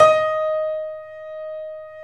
3D Pianos Yamaha C7; E-MU Producer Series Vol. 5

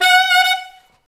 SAX A. STAB, SAX Alto Section; Roland LCDP07 Super Sax; Alto Sect FX

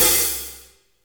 HAT; E-MU Formula 4000 Series Vol. 1; Hip Hop Nation; Bonus Perk

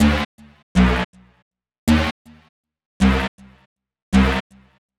Bass, Futurist Drum & Bass

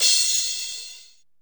drm G2, DRUMCOMPUTER, EMU SP 12; Best Service; ProSamples vol.55; Retro Sampler; EMU SP 12+1200

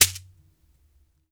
Berimbau Shaker, World Percussion; Sony CS

